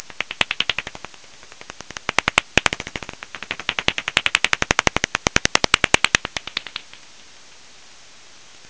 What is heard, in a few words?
L-t Bat Call Heterodyne